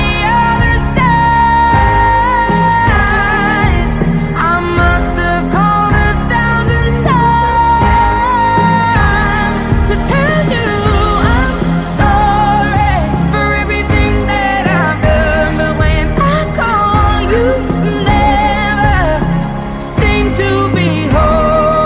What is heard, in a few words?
hello new english music ringtone